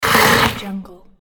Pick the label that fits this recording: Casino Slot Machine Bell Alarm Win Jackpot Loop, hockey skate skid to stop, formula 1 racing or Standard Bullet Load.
hockey skate skid to stop